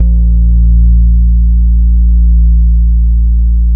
SOUL, Bass, B 2; Spectrasonics; Bass Legends

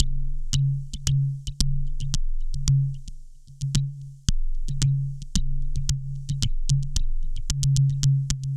Low Dirty Click Beat; Extra Tweak Grooves

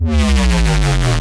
C Bass, DnB One Shots

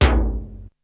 Tom, Drums; ZERO G - Datafile DISC 2

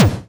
80s Drum Kick; Yamaha PSR E305

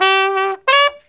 TRUMPET, BRASS

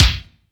KICK, AMBIENCE KICK

